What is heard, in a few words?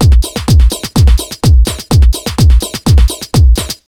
126BEAT, Disco House; USB Soundscan vol.20; Fresh Disco House I; AKAI